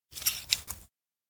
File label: chopsticks pick food; anemoi